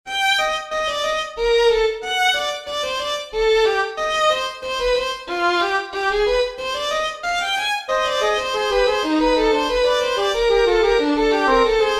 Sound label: Fugetta in G Minor